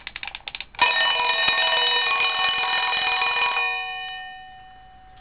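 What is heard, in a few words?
JUNGHANS, alarm watch; Ararm watch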